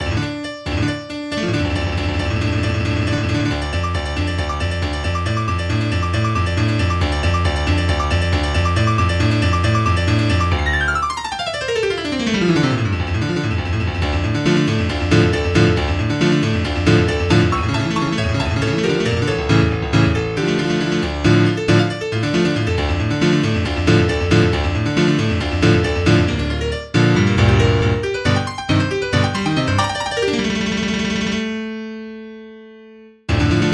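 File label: Symphony No1 Movement 1 in D minor Prelude, MIDI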